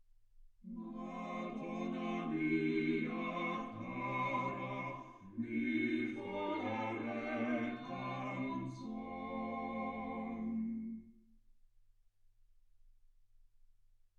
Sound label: quartet